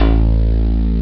d50 dumpf synth bass; ST-XX Amiga Soundtracker Sample Packs

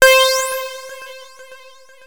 Access Virus B; Synthworx

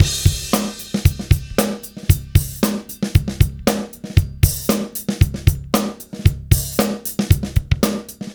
115, Beat Loops; Zero-G; Monster Beats

